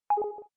Melody

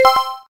selftimer

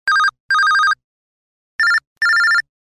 Simple Beep

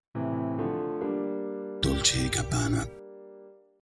Startup; Motorola RAZR V3i D&G

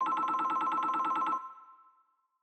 system ringtones abstract; Amazon Fire Phone

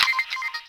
Camera Shutter; LG KU800 Chocolate; Event sounds; 32khz 4bit mono

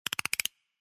send, Music Scale; LG U970 Shine; Keytone sounds

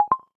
Cable Connect; LG; G2

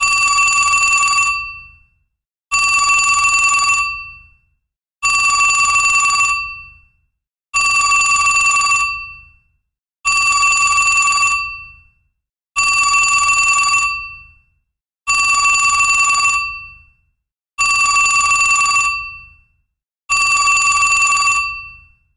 Vintage Telephone; LG; C440

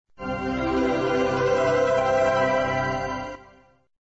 Start up; Motorola C381

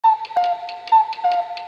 Clock Bell; LG U970 Shine

